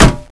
kick metal; cstrike; ZombieDarkness

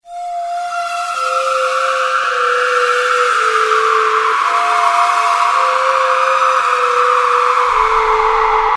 round fail; cstrike; zombie plague

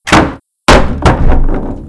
checkpoint plank remove, doors; left4dead

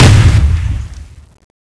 jump end; cstrike; Frozen Terror

